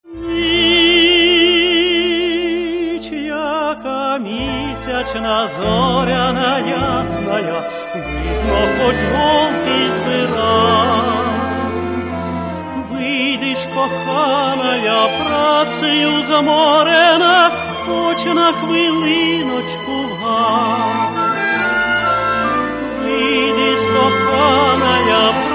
romantic songs

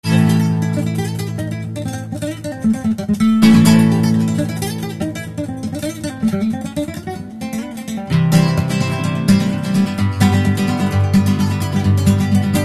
acoustic

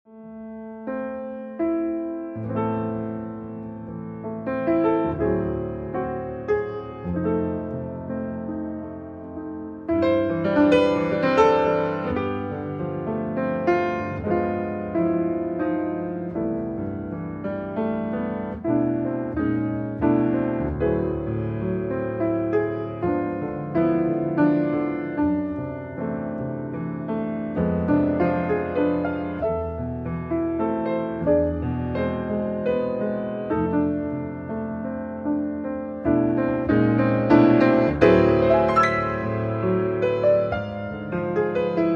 piano studio

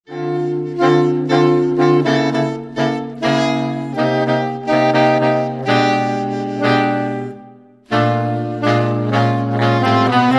sax

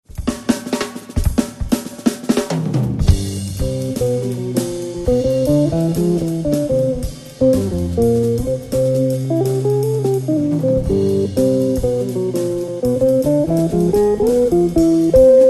drum

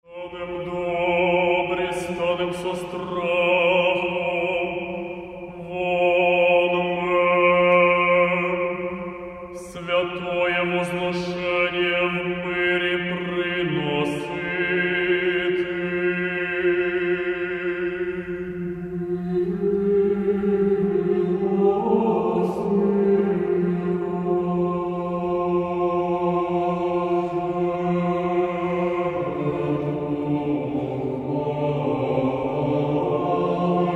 Choir Liturhia; Kyiv

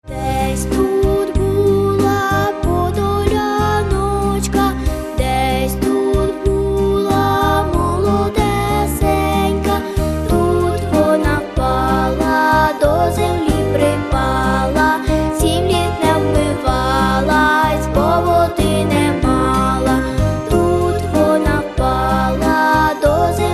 Karaoke

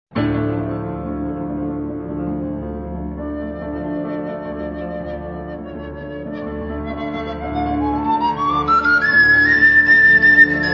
sopilka

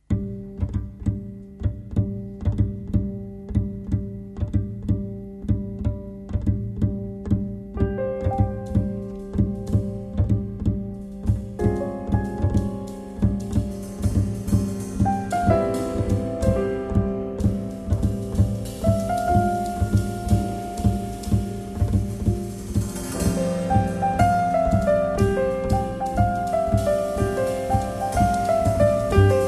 bass

